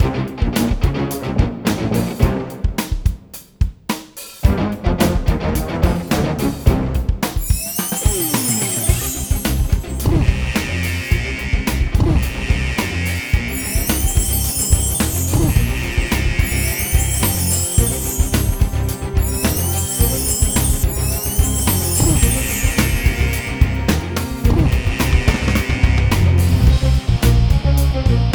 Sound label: Rock Loop with One Shots